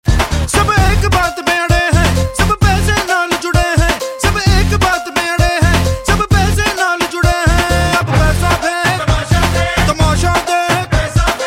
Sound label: Rock Tone